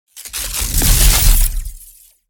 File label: Unleashing raw energy, shockwave